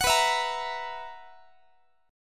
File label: B Major 7th Suspended 4th, B, down, Guitar; BM7sus4; strummed; x,x,21,21,20,21; 4A,4E,5A,5D,5G#,6C#